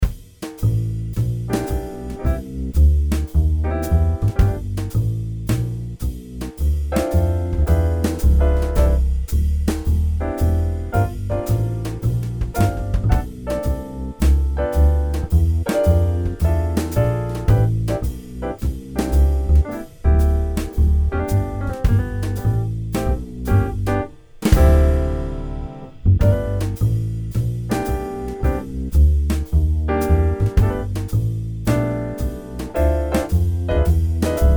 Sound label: Blues Solos Backing, jazz